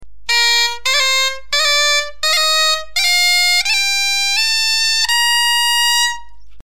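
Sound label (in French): montée simple ornementation, bombarde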